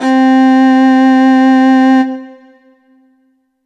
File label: Kawai K5000W Viola C4